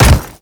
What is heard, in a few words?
Step Default; Leto